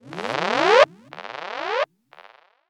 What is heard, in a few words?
sonar; wake-up-tones